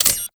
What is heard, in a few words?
Blastor Pistol Charge Finished